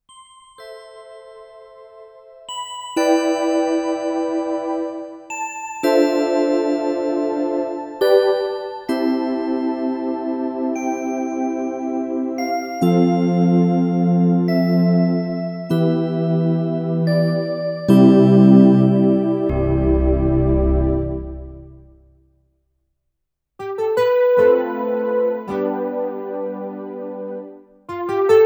Pads

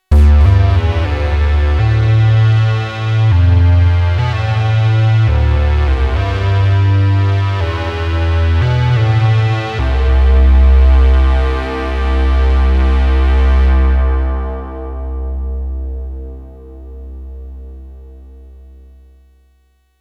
Modal Craft Synth 2.0

